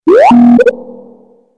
sms mail

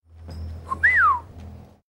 IPHONE REMIX

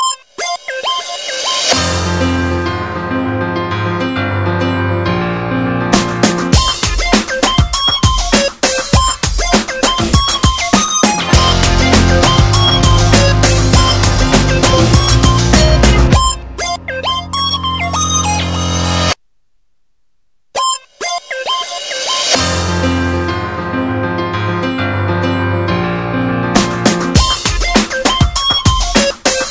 Electrifying Music

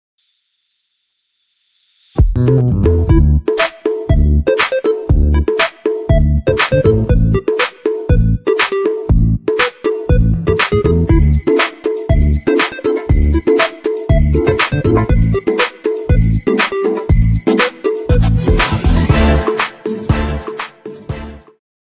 cool rhythm, ringtone

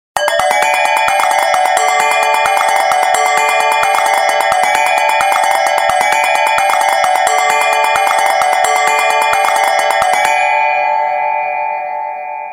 flurry of bells

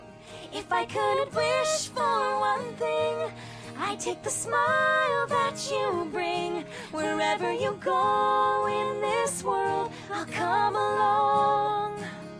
Two voices one song

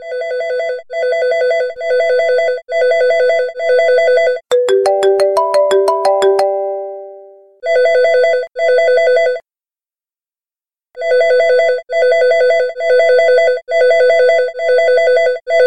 Clock Alarm

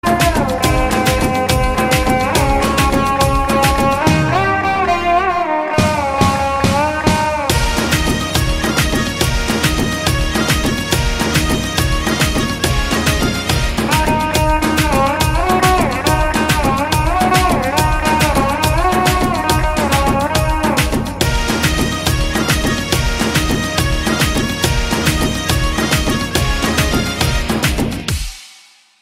guitar

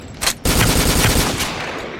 rifle

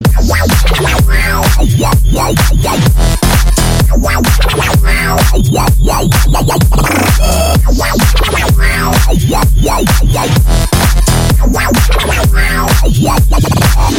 ringtone, hip hop

